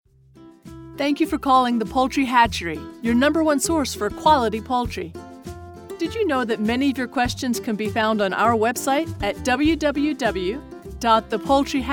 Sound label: Fretless Menu